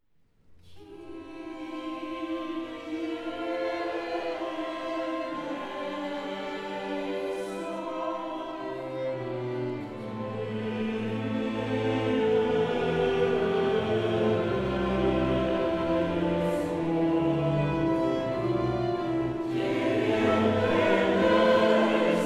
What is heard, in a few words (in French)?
Choeur mixte Kyrie; Choeur mixte de Saint-Joseph; Camerata Saint-Georges; Windhaager Messe WAB25; Anton BRUCKNER